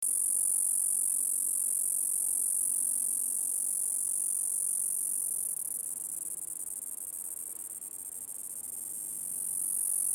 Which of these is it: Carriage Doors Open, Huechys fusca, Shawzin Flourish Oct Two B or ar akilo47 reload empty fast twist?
Huechys fusca